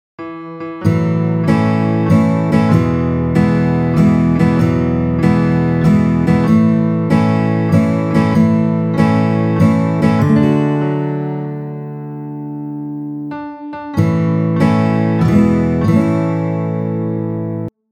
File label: happy birthday, guitar